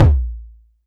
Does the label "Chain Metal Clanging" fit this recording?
No